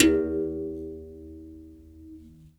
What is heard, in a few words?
Tar, One Shots, World Percussion; Sony CS